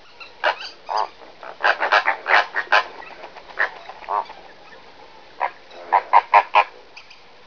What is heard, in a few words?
Bird; 特酷声音素材库